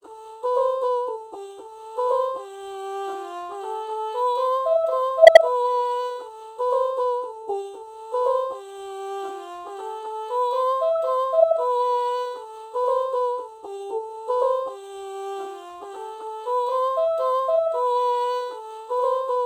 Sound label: ah oh melody morph